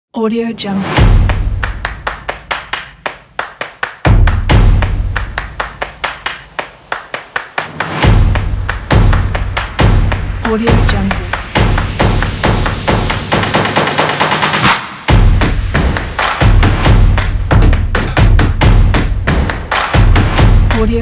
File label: stomps claps